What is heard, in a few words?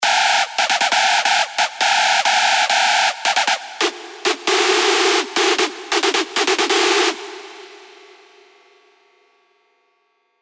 Screech Blunt Force, big room techno drops; Revealed Serum 2 Big Room Techno Drops Vol. 1